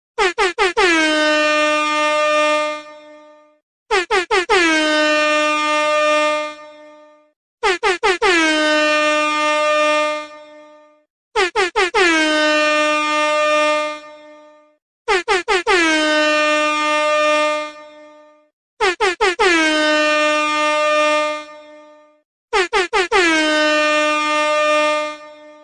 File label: airhorn